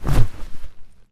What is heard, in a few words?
z swipe, Zombies; zps; Attack